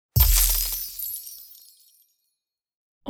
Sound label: christmas magic positive award chime ice break light hybrid